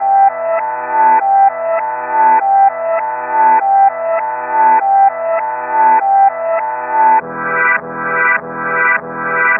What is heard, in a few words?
Loop